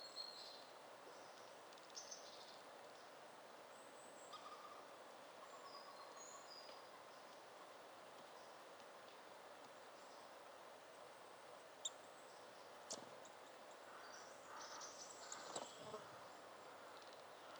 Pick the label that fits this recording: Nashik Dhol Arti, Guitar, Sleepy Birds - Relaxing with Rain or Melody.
Sleepy Birds - Relaxing with Rain